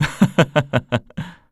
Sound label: laugh; Eternal Return; KOR